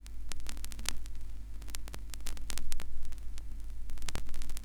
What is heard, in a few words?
NOISE; Xsample VOL-9